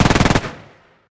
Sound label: bullet smg; ufoai; weapons